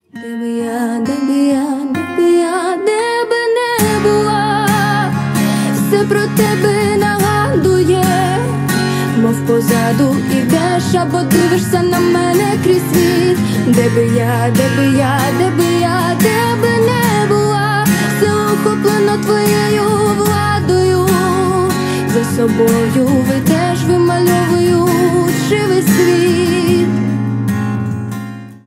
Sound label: acoustic cover; украина